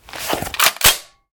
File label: machine gun draw; Original TK Sounds